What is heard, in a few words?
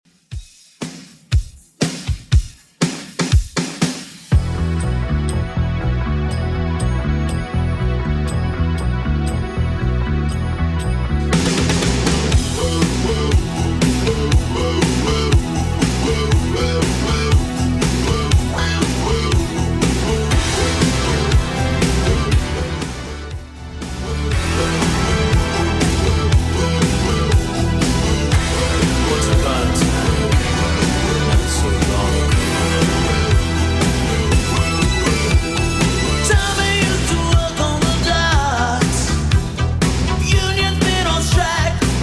80s Redrum 120bpm